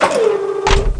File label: Laser Gun Load Snap; Defiance